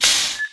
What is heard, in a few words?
small medkit